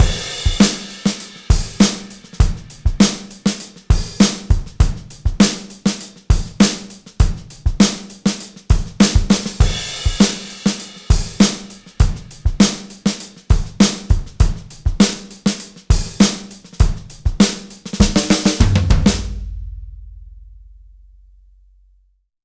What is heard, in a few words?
drums 610 b soft vibey